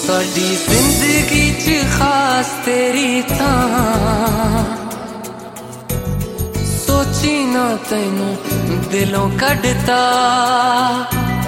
Sad Ringtone

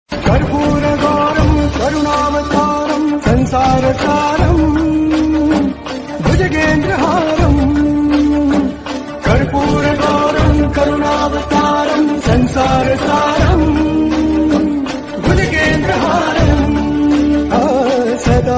Devotional Ringtone

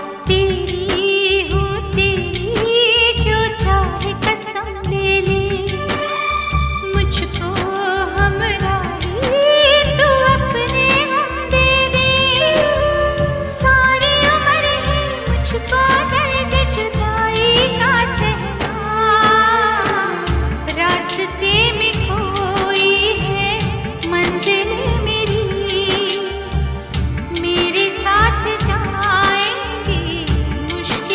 Sad Female Hindi Ringtone

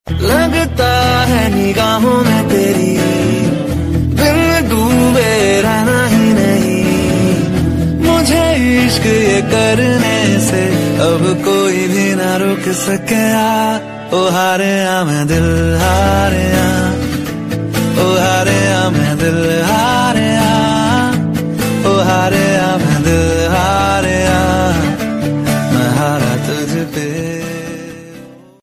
Hindi Love Song Ringtone

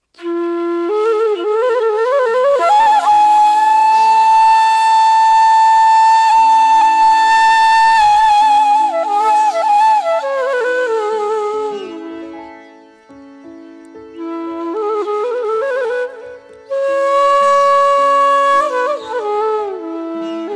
Bansuri Dhun, Instrumental Ringtone

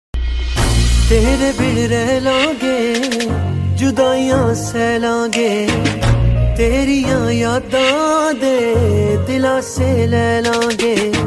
Sad Punjabi Song Ringtone

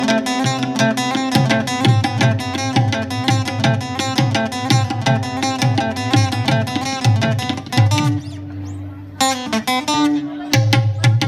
Music Ringtone

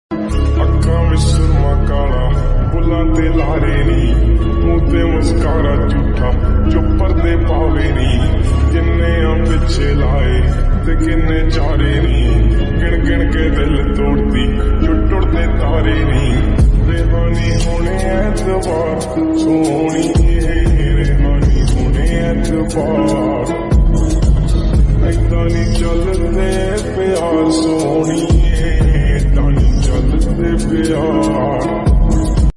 Lofi Version Punjabi Song Ringtone

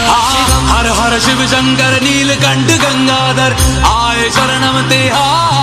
Har Har Mahadev Bhakti Ringtone

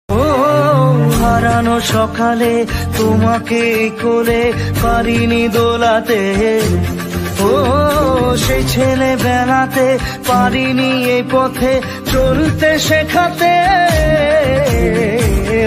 Bengali Ringtone

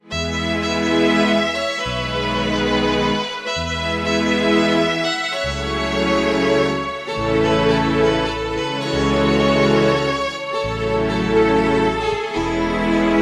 Background Music Ringtone; Arjun Reddy

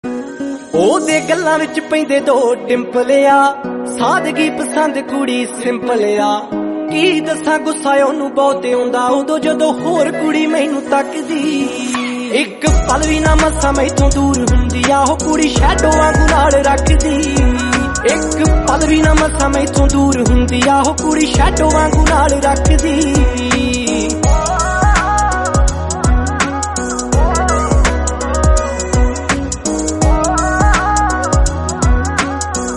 Punjabi Song Ringtone

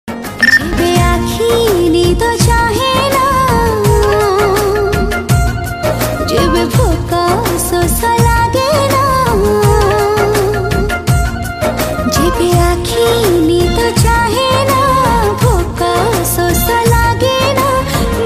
Odia Ringtone

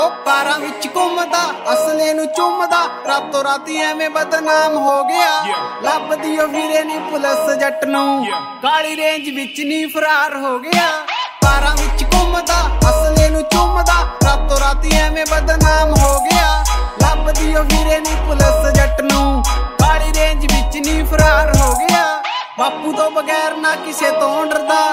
New Punjabi Song Ringtone

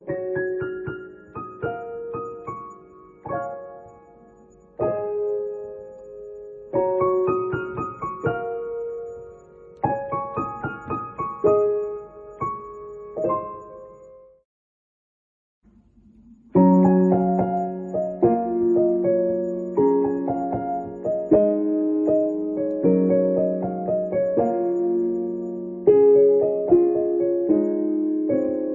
Instrumental Ringtone; ringtones

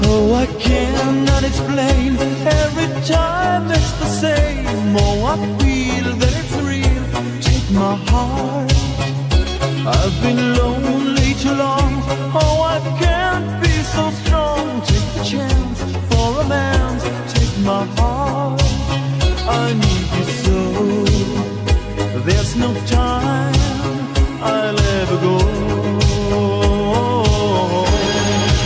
Slowed Reverb Ringtone

